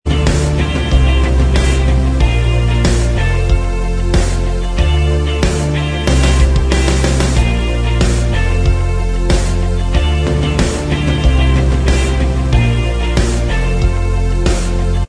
Inst

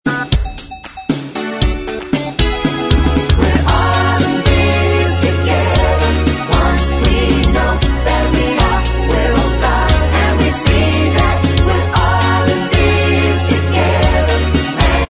backing vox

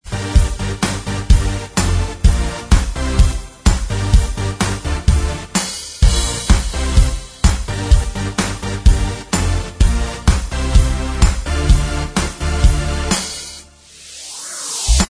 Inst Track

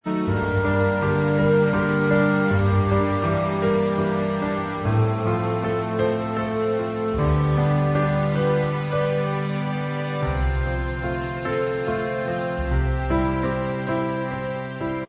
Inst Track

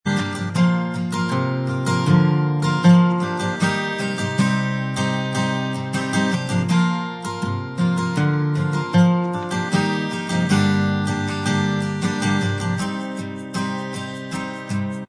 acoustic Inst Track, backing tracks

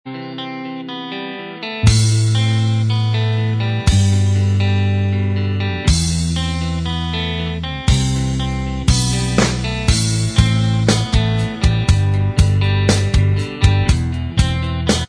Inst Track

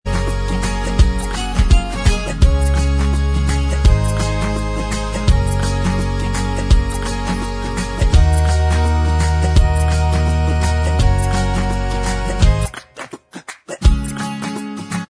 Inst

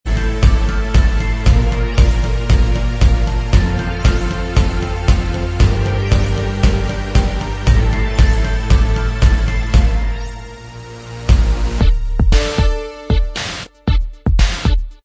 Inst

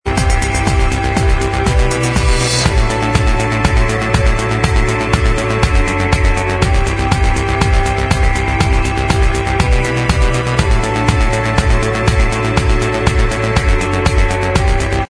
Inst Track, backing tracks

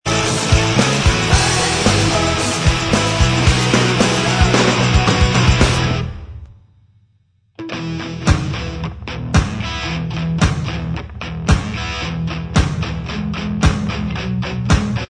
Inst

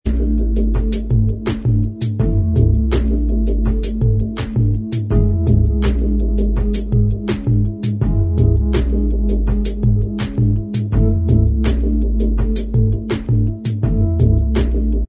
Inst

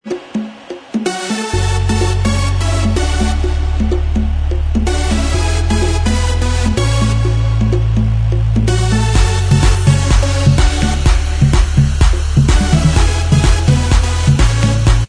Inst Track

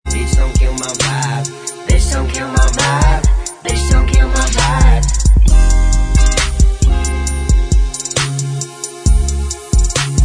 Bvox

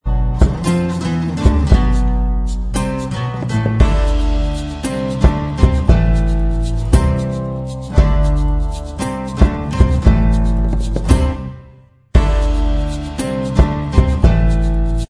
acoustic Inst Track, backing tracks

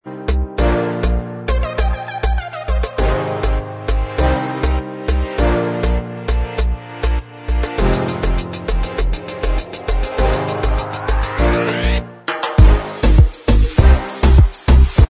Inst

